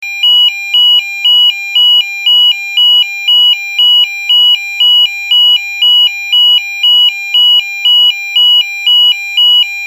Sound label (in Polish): ton